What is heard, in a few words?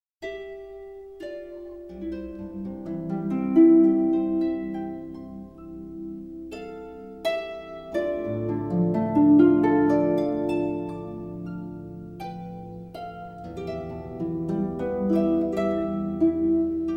Instrumental Harp Praise; In the garden